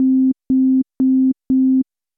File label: call busy; tweb-i2p